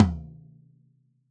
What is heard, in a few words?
Studio Toms Hit